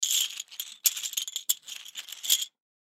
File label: Poker Chips Move, Poker Chips Movement Felt Table; Casino; Casinos; SoundEffects-Blastwave; Sports